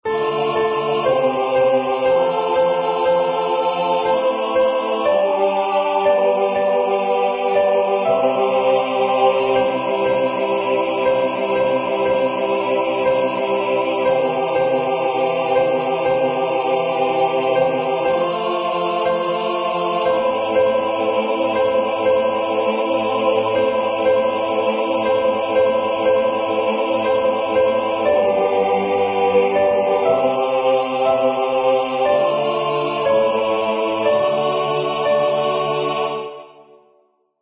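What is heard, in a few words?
Sanctus SATB Soprano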